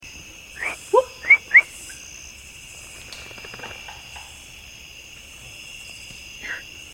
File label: knocks